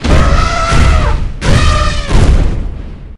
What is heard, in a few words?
elephant